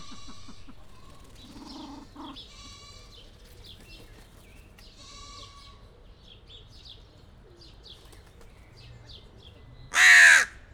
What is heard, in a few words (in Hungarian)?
határozottan, dolmányos varjú; kecskemeti zoo 2016